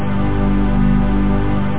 String chord; Instruments

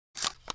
shotgun fill, weapons foley; MacFormat 2003 June; Spearhead Demo

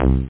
303 small bass; tb303 samples

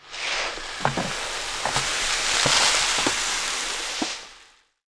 granary; Immortal

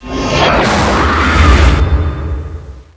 self teleport; chaos

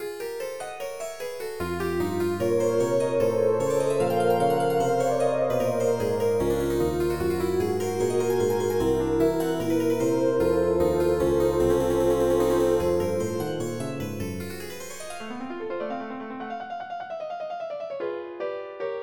quartet; aargon deluxe

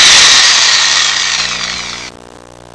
DRILL, TOOLS; Sound Effects for Multimedia - Volume 2

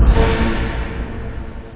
stabs; effects; OctaMED SoundStudio V1

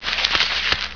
WRAPPER; NOAHDEMO

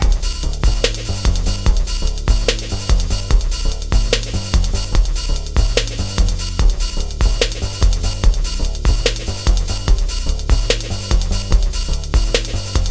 simple loop

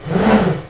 whoosh; Ferazel's Wand Demo

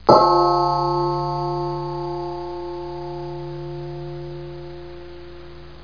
bigbell; effects

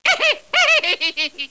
Feather; JONAH

